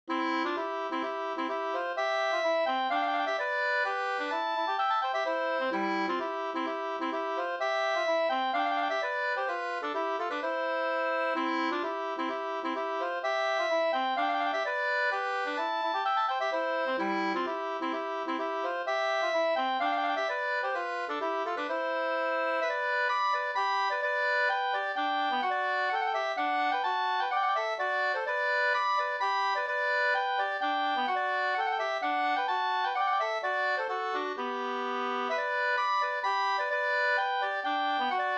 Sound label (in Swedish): polska Bodapolska